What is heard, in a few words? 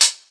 Hihat Closed